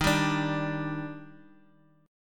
D sharp Major 7th D sharp, chord; x,3,3,5; 4G,5C,5E,5A; chordshape

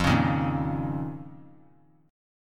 F 7th Suspended 4th Sharp 5th F down; F7sus4#5; strummed; JGuitar

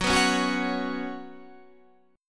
Gb Suspended 4th Gb, down, Guitar, Standard; strummed; JGuitar

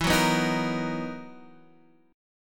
B Major 7th E; BM7/E Chord {X 7 4 4 4 6} strummed

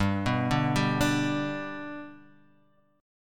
G Major G down Guitar Half Step Down

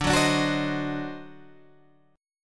D 7th Flat 9th D down Guitar Open G

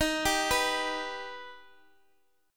Eb Augmented, Guitar Standard; arpeggiated; x,x,x,8,8,7

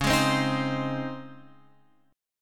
C sharp Major 7th Suspended 2nd, C sharp; C#M7sus2 Chord {9 11 10 8 X 8} strummed

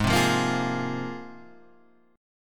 G sharp 6th Add 9th G sharp Guitar Standard; 4,3,3,3,6,4; strummed; capo=3

